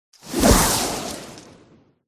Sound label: forest spirit death